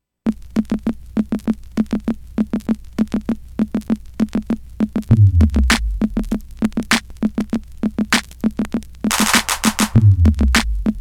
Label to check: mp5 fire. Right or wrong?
Wrong